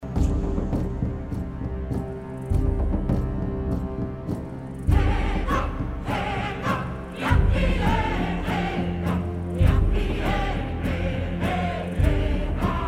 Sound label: Chorus; Pocahontas